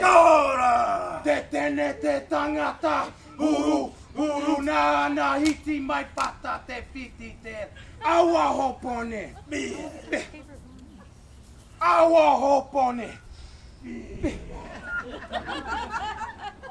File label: maori dance chant